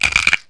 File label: Salt Grinder; Household; Kitchen